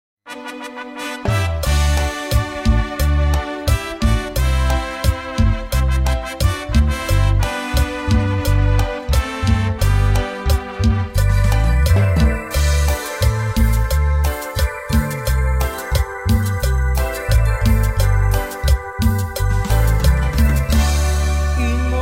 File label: alabanzas ringtone; android